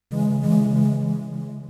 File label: wings, 140bpm keyboard; hous-tec